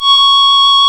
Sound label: REED C#; Big Fish Audio; Synclav FM